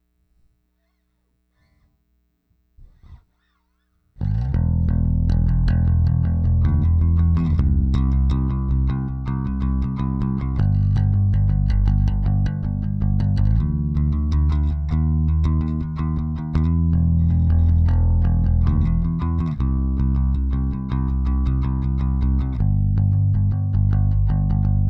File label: Bass